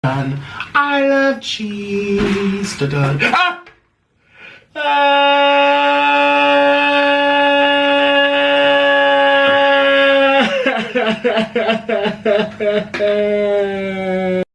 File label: Cado chant